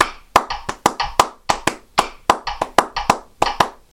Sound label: clapped; 12 claves